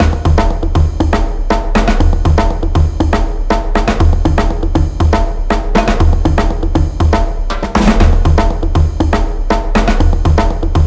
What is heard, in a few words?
120BPM, Percussion; Percussion Kits; Ghosthack; SkyRealm; Iron Fury